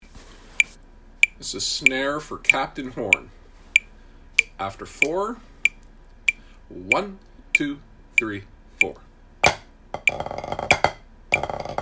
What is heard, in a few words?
Snare, pipes and drums